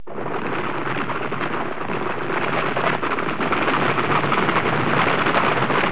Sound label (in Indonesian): suara mobil